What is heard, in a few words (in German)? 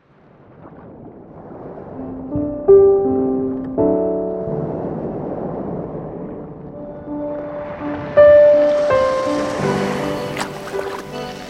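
Ostsee, Meeresrauschen, Wellen, Möwen; entspannung; syncsouls moooods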